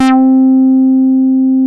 SQUARE, BASSES; Masterbits; Vintage Voltage

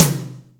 Room, Acoustic Tom; Yamaha DTXpress

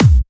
Trance Kicks

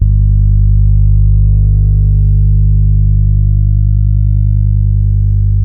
A 2, old soul, bass; Spectrasonics; Bass Legends

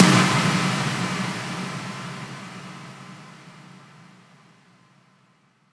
Impacts, Effects

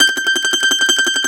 MAND TRM, Mandolin

